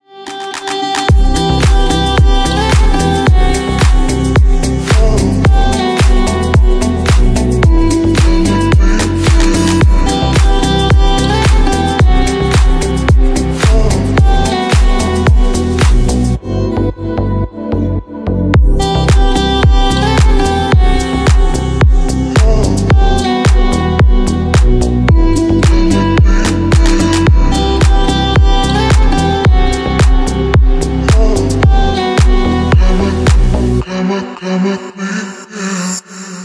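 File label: ringing instrumental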